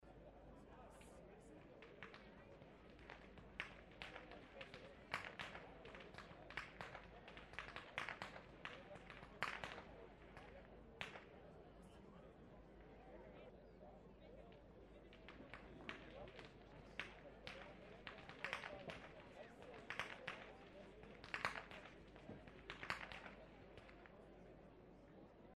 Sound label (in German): schnalzen, jugend